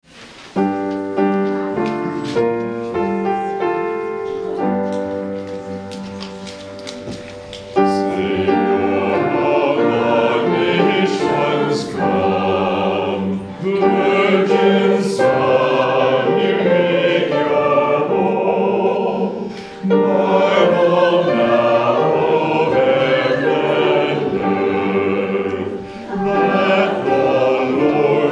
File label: hymn; Savior of the nations, come